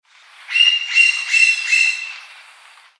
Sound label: Celeus flavescens